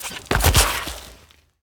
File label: Bodyfall Concrete; SFX Kit; Bodyfalls